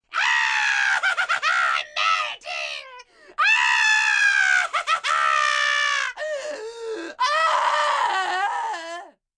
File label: Witch Scream, Wicked witch, scream; Screaming Sound Effects